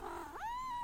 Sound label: mrrp